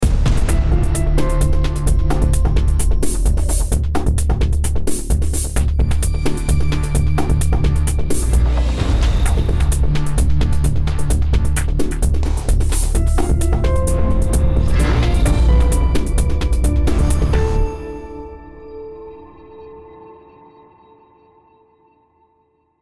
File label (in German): Indikativ; Kriminalreport